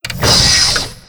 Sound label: Steam Walker Atk; FlyForInsanity